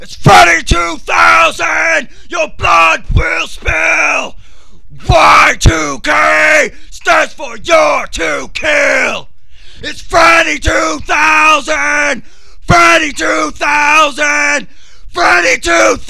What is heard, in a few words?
chorus